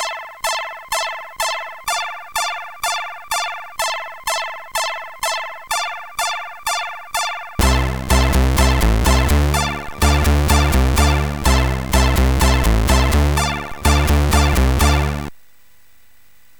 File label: Magical Spell, MOS6581R4; HVSC